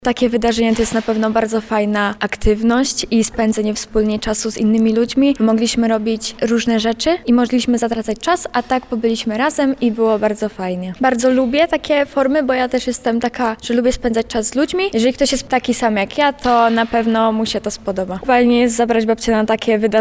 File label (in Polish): koleda kosciol; kosciol pawla